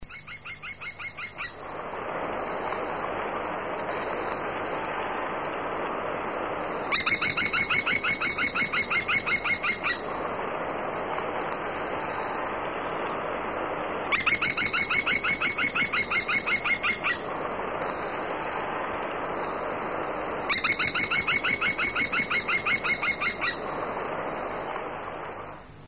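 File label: kestrel; postolka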